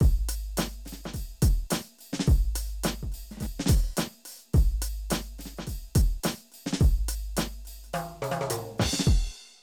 Drum loops, Hip-hop

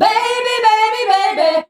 "BABY BABY", FEMALES; Best Service; Hyper Dance

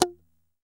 GTR FNKGTR, Clean E.Gtr, Strats; Roland LCDP02 Guitar and Bass; PYB Strats

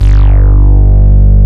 MOOG BASS, Moog Synths; E-MU Formula 4000 Series Vol. 3; Analog Odyssey

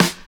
SNARE 2; East West - Phat & Phunky

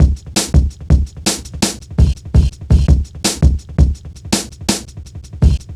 Funki Tuff, Jungle, Drum Loops 166.5

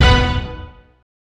old skool stab

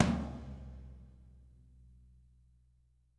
TOM, WARM+FAT KIT; Spectrasonics - Burning Grooves CD2

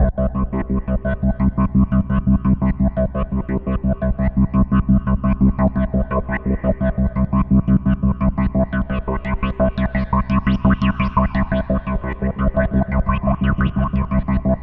C1, Roland JV 2080 CLUB ORGAN

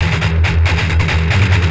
Treat Guit, Industrial Nasty

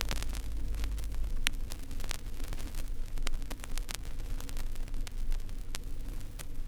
Crackle, Vinyl Noise

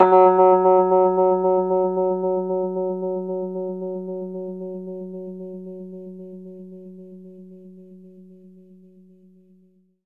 Rhodes fast F#, 3D Keys; 3D Sound; 3D Keys VOL-1

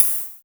H open, Drumsynth Drums

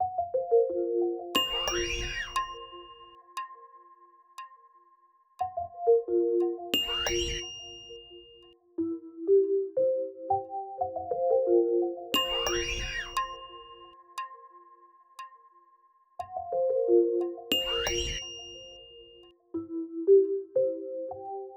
Melody

